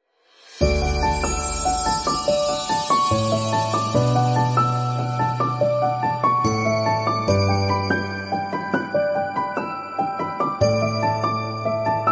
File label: Romantic sentimental